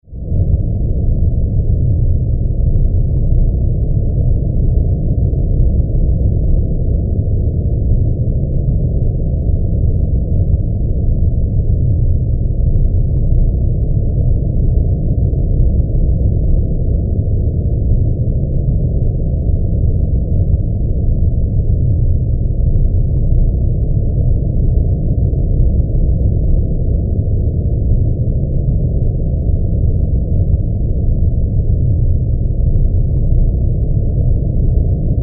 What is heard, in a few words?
Drone, Underwater, Cold, Rumble; 5.1; Sound Effects:Science Fiction; BlastWave